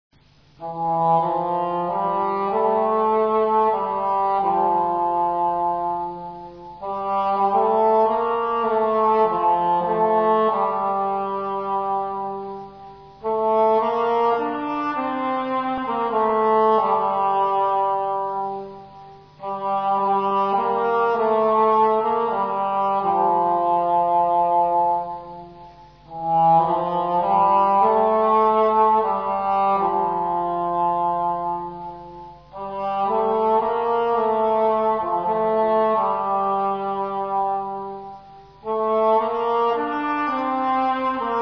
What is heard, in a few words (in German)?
englhorn